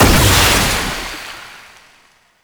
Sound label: Wrist Gun Alt Impact; Weapons; Warframe; Tenno